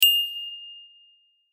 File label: ding